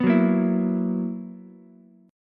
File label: B Major 7th Suspended 4th B down Ukulele; BM7sus4; strummed